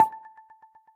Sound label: tick; camera app